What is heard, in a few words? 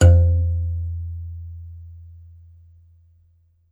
BASS KALI, African Melodic; E-MU Producer Series Vol. 7 – Old World Instruments; World Combos